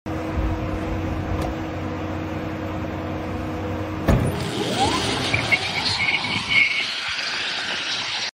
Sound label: Rimac Nevera; ماشین